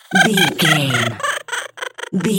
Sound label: goblin fairy laugh high